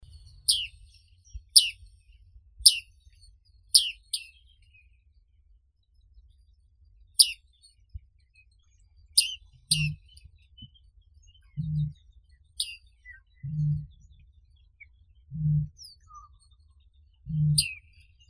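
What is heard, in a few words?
bearded tit, Panurus biarmicus; sinitsa usataya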